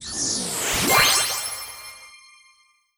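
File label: Sound Effect System Stage Round Complete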